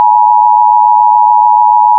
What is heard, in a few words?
alert buzzer; Alcatel Idol X